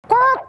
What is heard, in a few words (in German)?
Gockel, Gackern